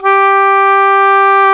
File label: oboe, Oboe Brth